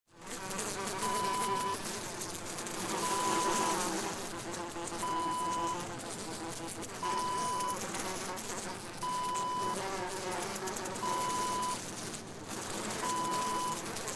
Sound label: flys